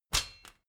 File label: step ladder metal hard single; schritte